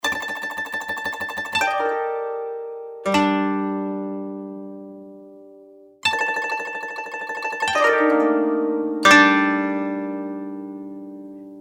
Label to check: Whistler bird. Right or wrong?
Wrong